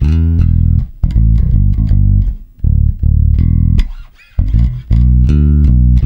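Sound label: shuffle E, bass; Spectrasonics; Bass Legends; SHUFFIT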